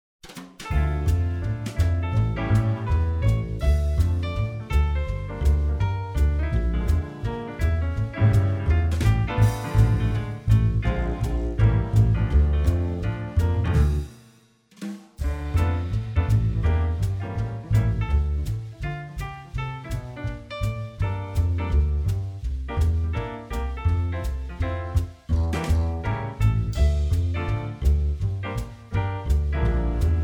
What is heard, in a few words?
Dm